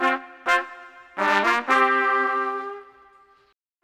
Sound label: Trumpet